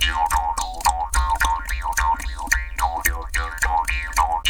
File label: Jaw Grooves, Didgeridoo and Other Primitive Instruments; Big Fish Audio - Didgeridoo and Other Primitive Instruments